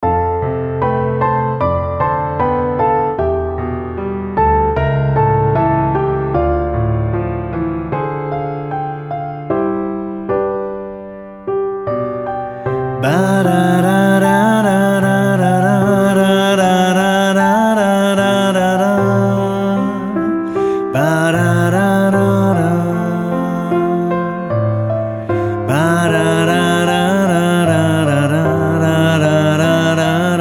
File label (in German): Instrumental unbetextet